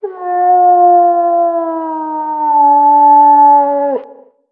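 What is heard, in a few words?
wolf distant; ModernWarfare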